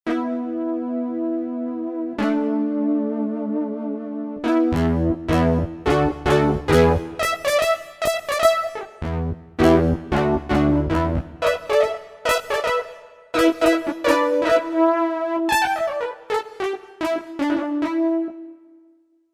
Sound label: Analog Brass, Roland JV-880; Factory Patches